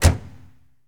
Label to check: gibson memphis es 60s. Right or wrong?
Wrong